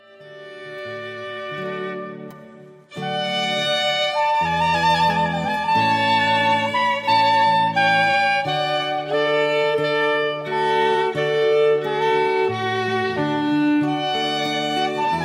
Trumpet Voluntary

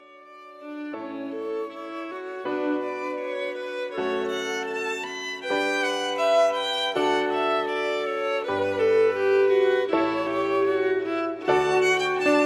Canon

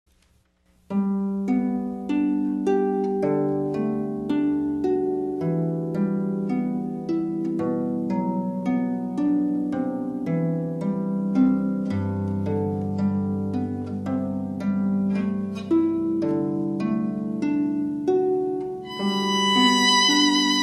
Canon in D

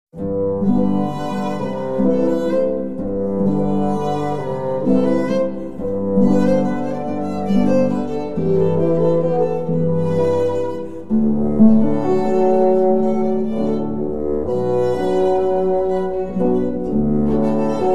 Flower Duet

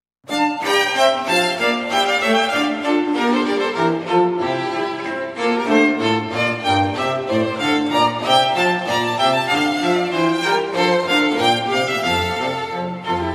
Bourree

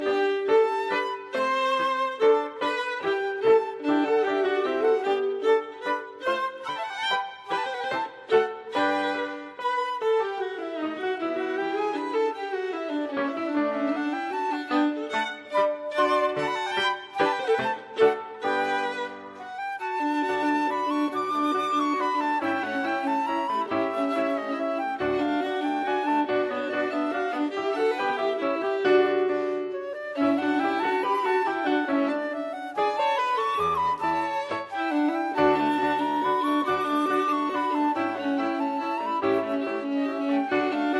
Minuetto

